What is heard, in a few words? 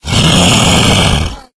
tank fail, voice, growl; Left 4 Dead 2